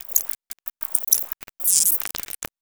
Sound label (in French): insecte synthetique